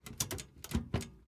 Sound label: front handle grip